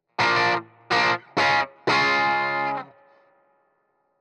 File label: Heavy GTR L